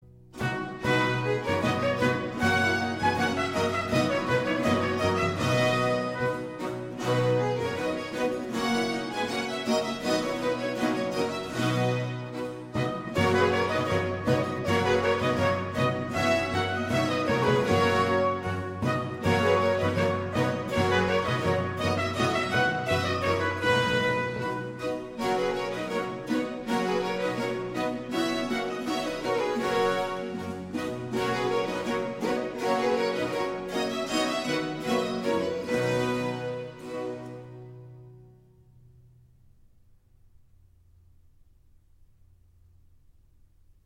Second act tune; Henry Purcell; Semi-opera

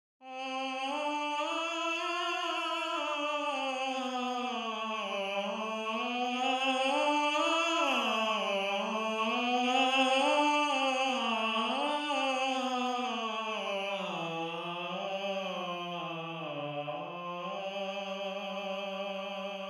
Tenor

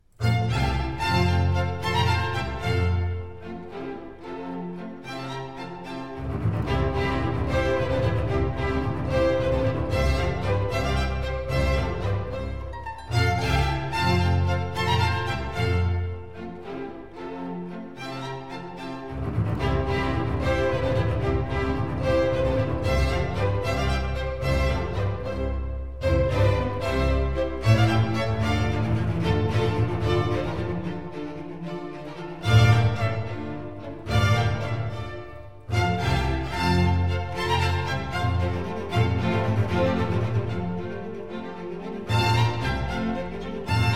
Concerto in Fa maggiore - Minuetto [Allegro]; Vivaldi; Concerto RV 136; Concerto ripieno

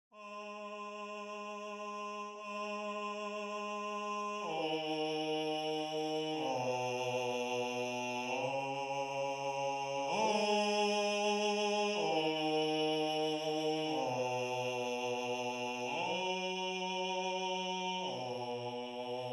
Bass

